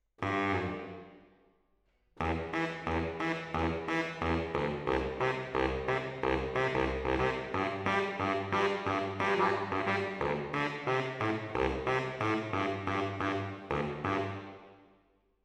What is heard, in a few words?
MKH AB, piano